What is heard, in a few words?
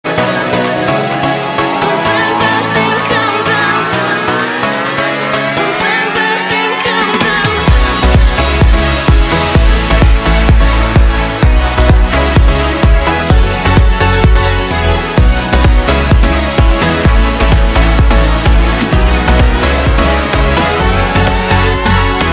trance, ringtones electronic